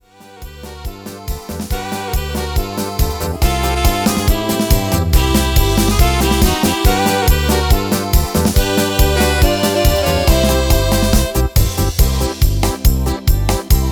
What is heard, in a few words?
Polka; YAMAHA